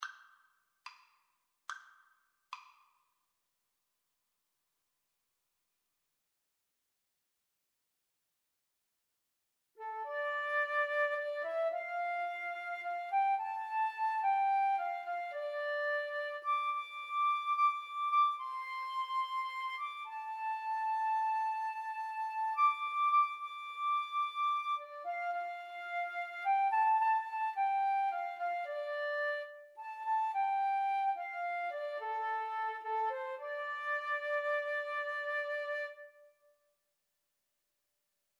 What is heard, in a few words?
house of the rising sun, Flute Duet